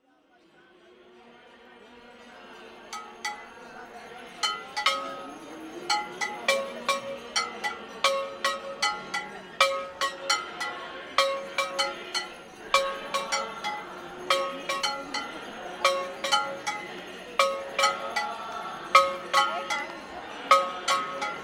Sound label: Ganga Aarti Ceremony Haridwar, Religious Music; Samuel Corwin; Selected Field Recordings from India and Nepal Volume II Religious Music